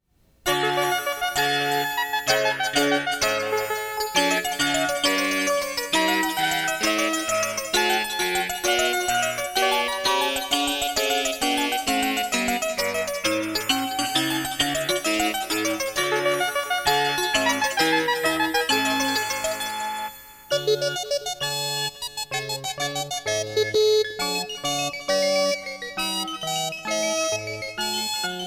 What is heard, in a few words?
Allegro from the Water Music